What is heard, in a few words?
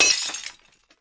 jewel break